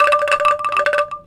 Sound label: Bandung Angklung Vib